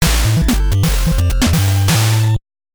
Goal; J.League Winning Goal; NES

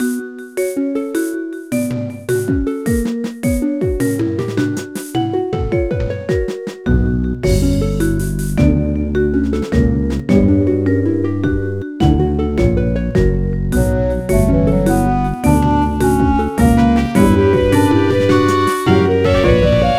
BGM Ticketshop; Irodzuki Tingle no Koi no Balloon Trip; NDS

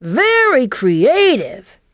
Correct

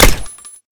DAEWOO K7

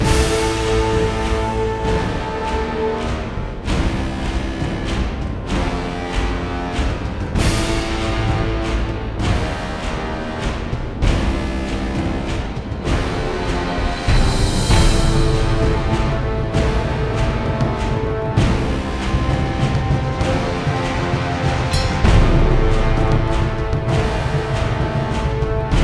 Bossfight Stage Action; Colosseum Bossfight Stage 02

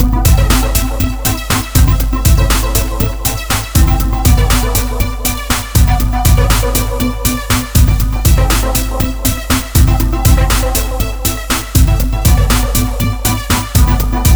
Music Loading; Perfect Dark Zero; x360 gamerip

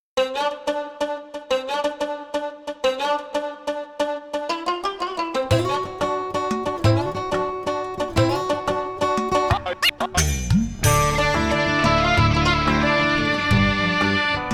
Bazaar

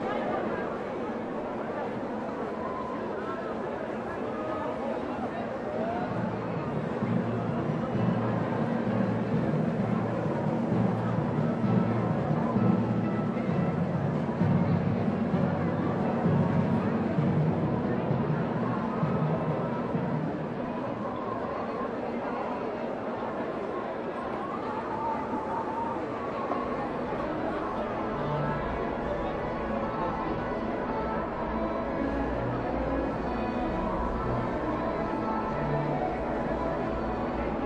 Ambience, Stage; the great ace attorney chronicles; The Great Exhibition

